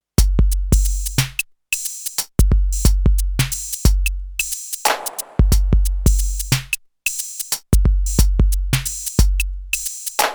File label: 808 alt tube